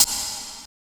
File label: snare+hat; DRUM LIBRARY; DRUMS